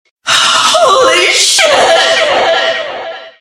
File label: music stinger; killstreak music